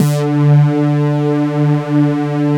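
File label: SATURN D4, bass; Drums In Your FACE!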